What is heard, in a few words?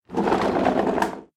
Scrape